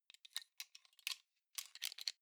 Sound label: plastic frame small mechanics rattle